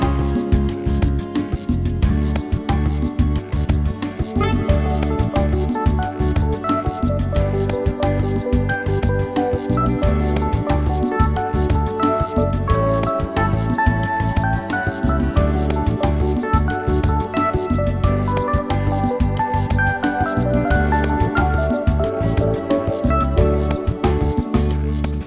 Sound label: Slow Lovely Dancing